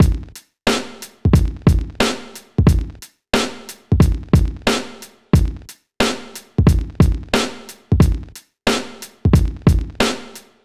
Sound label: 90s hiphop loop 90; Reference beat bpm90